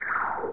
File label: windows 98 question; windows 9x; sound schemes